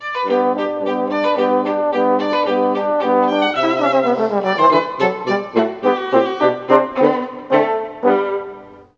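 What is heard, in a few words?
Tbn Vla Hn, trombone viola horn; Juilliard Music Adventure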